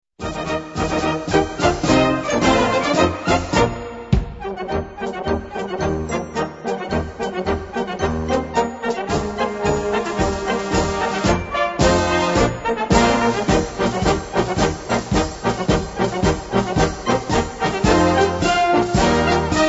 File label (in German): Radetzky-Marsch